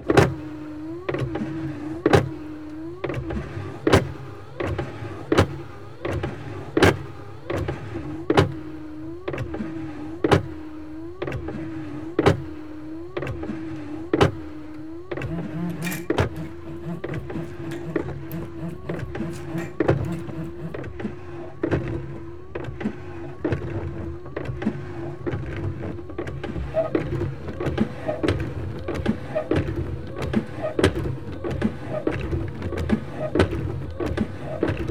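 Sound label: car windshield; street traffic; best service Blue Box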